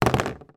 household plastic television remote control place down on table